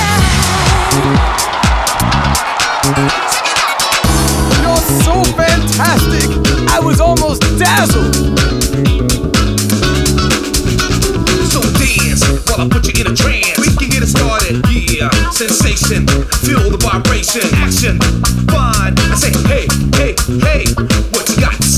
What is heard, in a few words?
Funk Boogie; Dance Dance Revolution Festival Strike